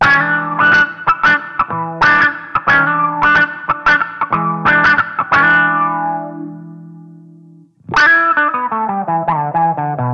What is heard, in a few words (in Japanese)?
Clean Attack フル